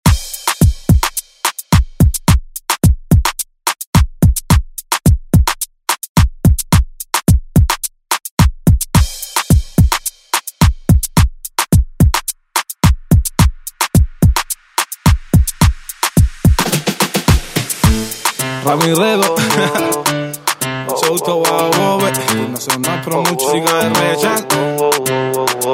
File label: Latin Urban Redrum; Deejay Hit